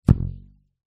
elastic ribbon twang; foley

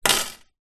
chain small decorative down on glass table; zapsplat

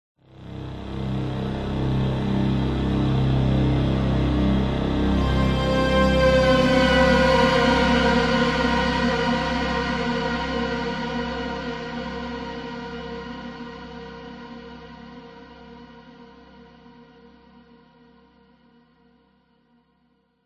horror swell dark synth evil buzzing descending

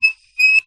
foley wood balustrade turn squeak; zapsplat

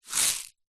salt rock in plastic shaker tip movement

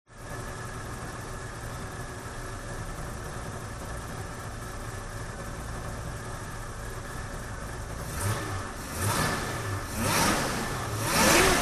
VINTAGE CAR Chevrolet 57 Rev Engine; TRANSPORTATION; smartsound

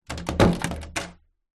household door internal bathroom close

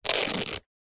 rollerblade boot velcro rip; foley

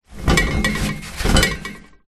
household toilet roll pull on metal holder rattle

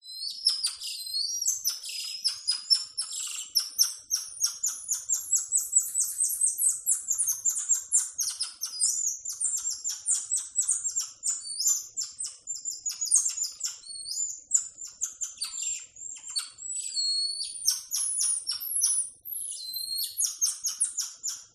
Monkey Golden Lion Tamarin; audio hero

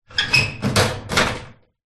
industrial metal cabinet bolt unlock open; zapsplat

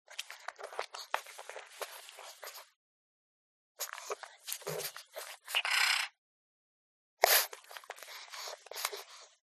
DOG Pug Smack; ANIMAL; smartsound

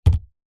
orange set down on wood table; zapsplat

